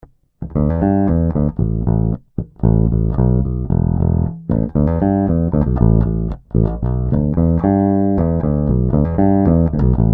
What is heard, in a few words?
Harley Benton BZ 5000 Fingerstyle active